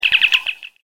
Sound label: Communicator